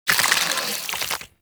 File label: Draw, Weapons; Prime Derelict Cernos